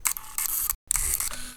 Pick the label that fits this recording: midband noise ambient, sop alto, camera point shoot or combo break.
camera point shoot